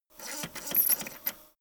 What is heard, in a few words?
servo stutter